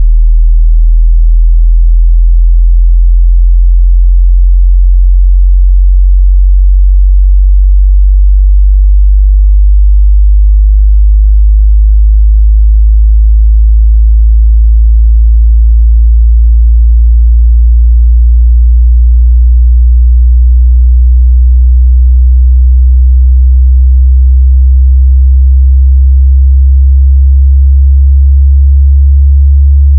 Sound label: Linear Sweep 40-70Hz; 0dB Sine Test Tones and Sine Sweeps